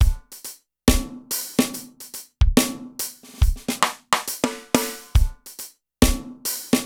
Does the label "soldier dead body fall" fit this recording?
No